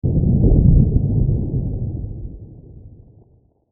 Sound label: Underwater Splash, Underwater Splash Explosion Distant; Nature; Blastwave